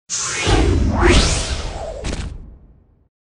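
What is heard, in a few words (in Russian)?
зачарованная магическая атака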